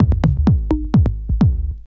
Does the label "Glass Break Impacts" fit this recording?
No